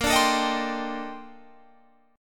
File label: A sharp Major 13th A sharp; strummed